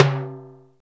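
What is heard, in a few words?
timbales; Roland LA; ETHNIC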